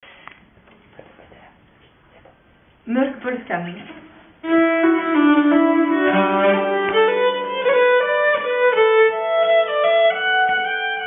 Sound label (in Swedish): Polska, nyckelharpa; Louise Polska